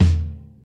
subtle reverb kick drum sound e key, Royality free kick drum sound tuned to the E note; kick drum samples